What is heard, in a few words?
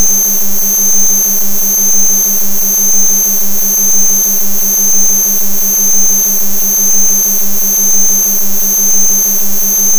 fly in the head; horror